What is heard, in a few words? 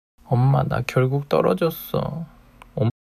crying